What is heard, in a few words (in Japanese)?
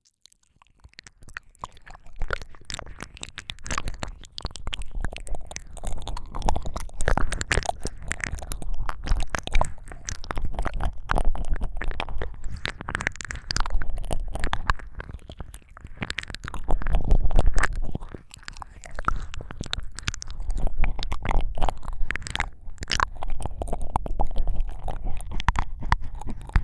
両耳舐め, ASMR